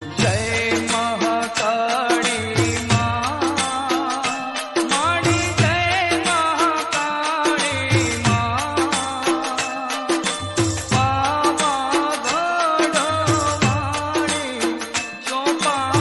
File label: gujrati devotional